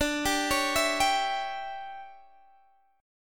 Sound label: D Major 7th Suspended 2nd Suspended 4th D, Guitar, DADGAD